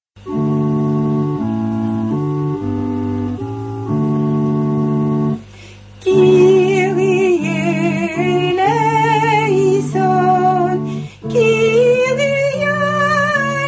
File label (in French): messe kyrie; messe de saint boniface